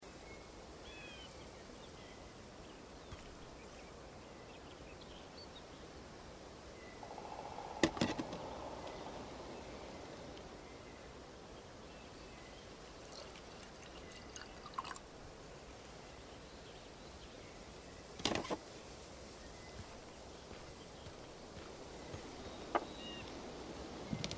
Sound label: morning camp hintergrund; Life is Strange 2; gamesoundopedia